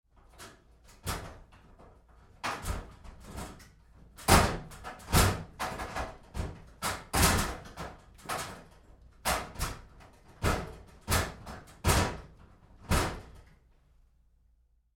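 Door Locker Handling Moves Roomy Medium, Metal Door; Doors; Big Room Sound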